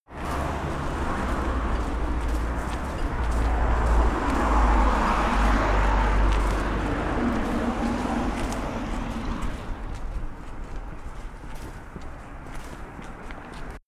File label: walking down the street